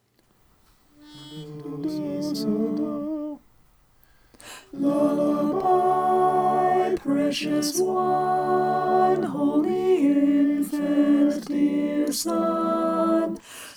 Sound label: alto